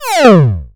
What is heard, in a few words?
Fail